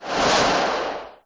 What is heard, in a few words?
mount; sfgame; mounts